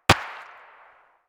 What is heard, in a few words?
body armor distant; Escape from Tarkov